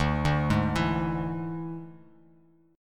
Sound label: D Suspended 2nd Flat 5th D Bass Standard; arpeggiated